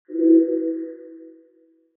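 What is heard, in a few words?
arrow click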